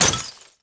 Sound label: Pott Clps Sm; objects; Star Wars Obi-Wan; XBOX